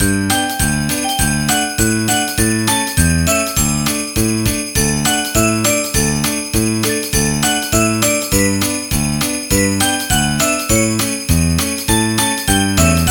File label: Jingle Bells